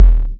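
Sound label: bass drum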